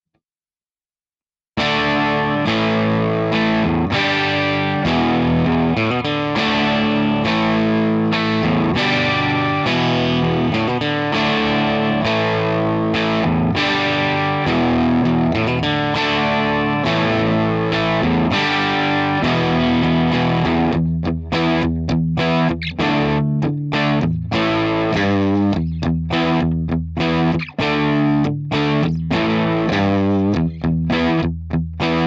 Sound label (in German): Aufnahmevergleich Interfaces Garage Band nur Omega